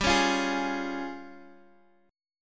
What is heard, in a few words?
Db Major G; Db/G; strummed